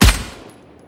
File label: pause back click; Clicking Head